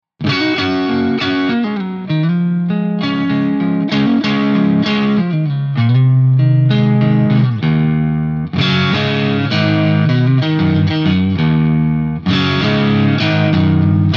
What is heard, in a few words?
strato crunch; Rock; Stratocaster